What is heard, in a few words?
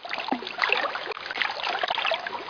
Water